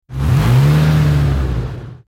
vroom

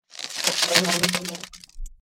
flashfreeze

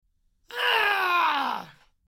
enraged grunting

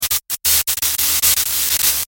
short circuit

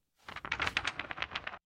paper notes flutteri

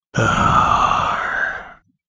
vampire like sounds

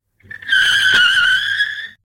motorcycle courier

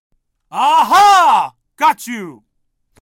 security guard burst

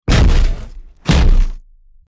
mechanical robot

